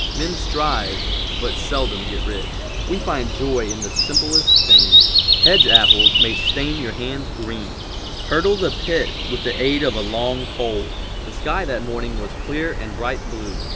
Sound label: birds